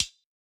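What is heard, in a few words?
closed hi-hat; eddyflux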